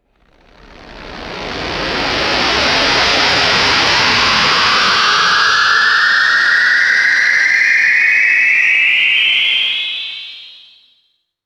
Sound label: synthetic filtered wind noise swell